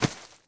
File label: bodyfall grass, foley